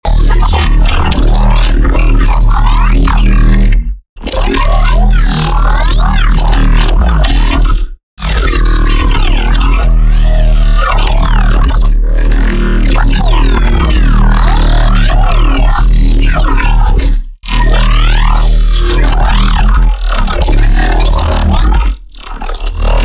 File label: grolwer, bass